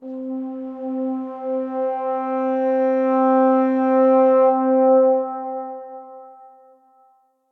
MPE Intimate Slide & Pressure, Brass Quartet; Ableton; Factory Packs; Spitfire Audio

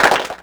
Clap box Pitch 6; mpk mini presets; Ableton Foundation FreePacks

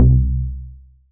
Bass Grit, collision; Ableton; Factory Packs; Synth Essentials

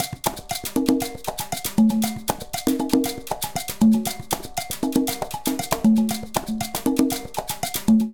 Descarga 118bpm, Latin Percussion; Ableton; Factory Packs

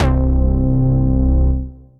Bass Bouncer

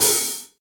Hihat Brush Open Brush Sizzle; Drum Hits; Ableton; Factory Packs; Session Drums Club; MPE Hits

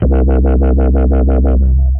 Sub Wobble, Bass; Sub Wobble 2; The Forge by Hecq; Ableton; Factory Packs

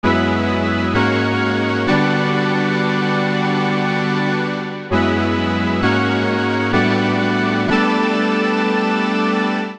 Pennies E Minor 86bpm; MIDI Clips; Music; Accompaniment; Ableton; Chop and Swing

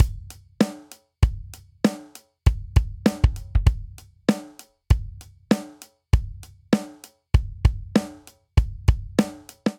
Straight Hip Hop Verse 98 bpm; 4 4; MIDI Clips; Ableton; Factory Packs; Drum Booth

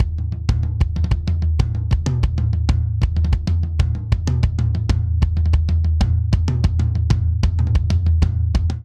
Simple Seven Buildup 7_8 190 bpm; Ableton; Factory Packs; Drum Booth

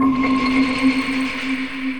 Grand Piano Upward Grains; Ableton; Factory Packs; Grand Piano Hybrid

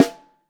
Snare Top

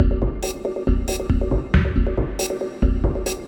Sweep Surveillance Kit 138 bpm; MIDI Clips; Drums; Ableton; Factory Packs; Mood Reel

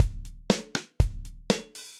MPE Kit Old School, Drums; MPE Kits; Ableton; Session Drums Studio

